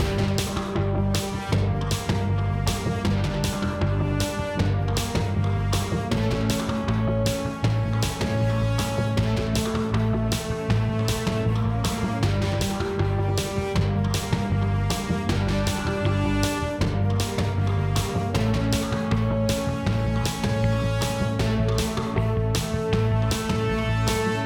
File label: Tornado Platforming MIXED; Ben 10; switch gamerip